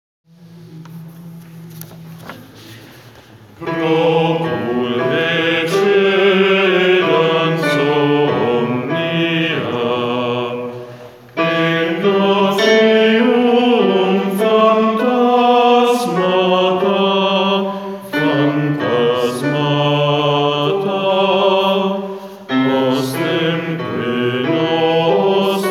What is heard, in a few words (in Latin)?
Te lucis Basso; repertorio polifonico